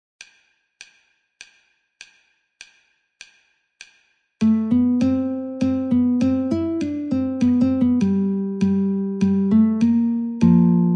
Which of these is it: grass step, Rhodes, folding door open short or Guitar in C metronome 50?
Guitar in C metronome 50